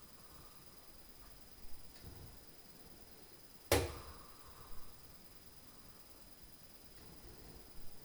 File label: TAC, Beast Noise